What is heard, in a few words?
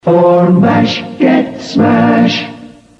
Smashing Smash Mash